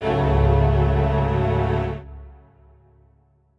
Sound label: strings